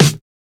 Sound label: Snare; FLPRO